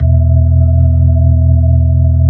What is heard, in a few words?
B-3, Brite; Keyboards of The 60's and 70's